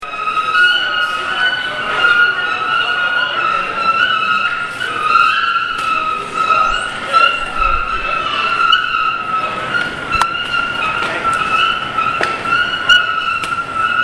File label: metalic dog squeeks